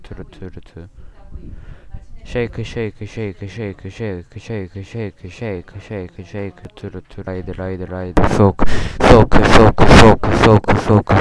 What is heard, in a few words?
shake